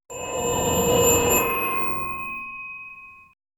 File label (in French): son de roulette gacha sty